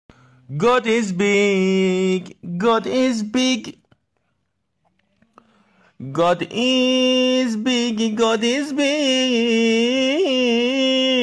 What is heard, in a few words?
Azan English; اذان-انگلیسی